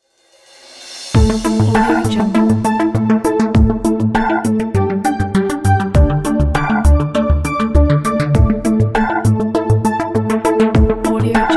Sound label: tech news ident